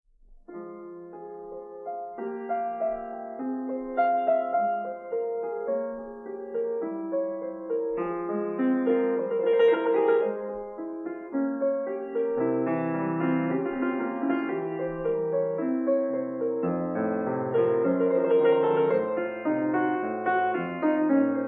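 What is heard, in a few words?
F minor Prelude, piano; BACH; WTC; BOOK I; Feinberg, Samuil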